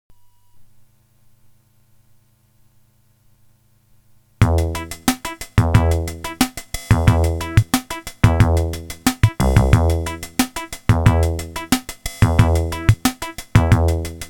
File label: Yamaha PortaSound VSS-100; Musical Showcase